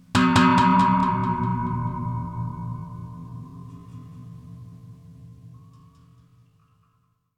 water; ウォーターホーン06; 不穏でミステリアスな音 Waterphone

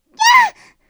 kyaa; 女; 悲鳴８ きゃあ; ちょっとした悲鳴

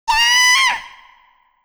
kyaaa; 悲鳴６ きゃーー; 少女的悲鳴

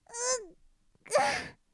damage; 女; ダメージ10 うっ…くぁ; 軽めの痛み

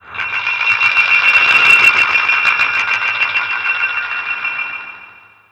suzu; 鈴の束; 風が吹いて一斉に揺れて鳴る無数の鈴

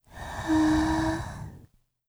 ghost ah; 女系 霊 12; 霊の息 あ〜 単

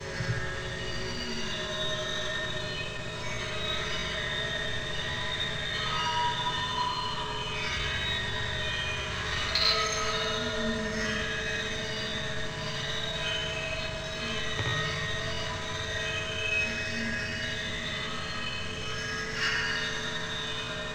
water; ウォーターホーン03; 不穏でミステリアスな音 Waterphone

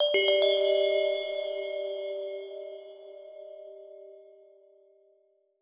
mysterious; ＳＥ ﾃｨﾛﾘﾛﾝ…; ミステリアス効果音 気づく・アイテム取得・イベント

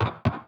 ashi 1 step; 足音９ （ワンステップ）; 一歩ごとの音付け設定に

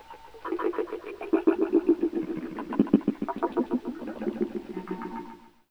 mizuoto; 水音１; 水の中を泳ぐ 沈んで行く あぶくプクプク 環境音

